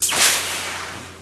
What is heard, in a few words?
Future Gun Shot Sing, futuristic; Future Gun Shot Single